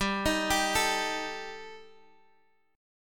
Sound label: G Suspended 2nd Sharp 5th G, Guitar, Open D; chordshape; down